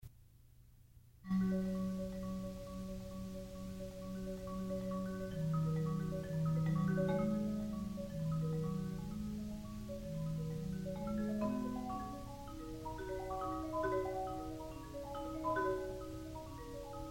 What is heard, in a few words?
Waves Marimba Solo